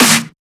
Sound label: SnareClap; FLPRO